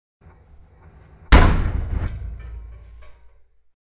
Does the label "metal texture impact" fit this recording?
Yes